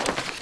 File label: wheat paste apply poster